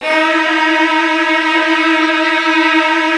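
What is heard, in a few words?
Virtuoso Strings, violin trills, D#1; ILIO; Kirk Hunter Virtuoso Strings VOL-1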